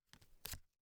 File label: Deck of cards dealt on to felt table surface; Timothy McHugh; Playing Cards & Betting Chips